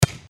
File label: volleyball serve